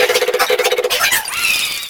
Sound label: Fan Chant; Mario Paint